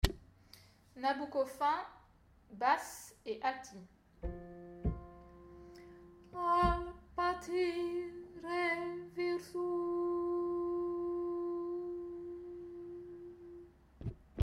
fin altos basses

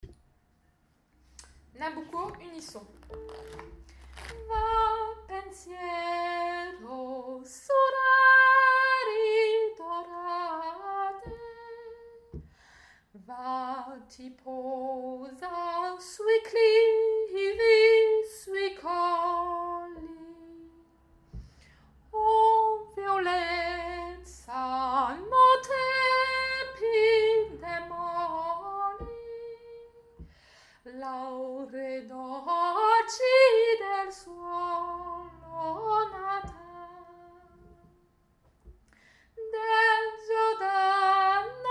Nabucco unisson

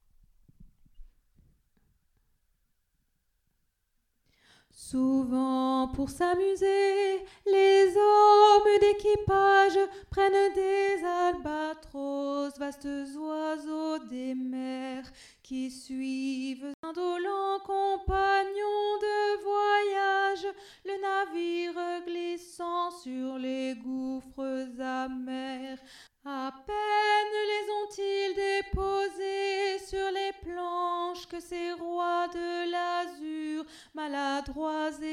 alto; l albatros

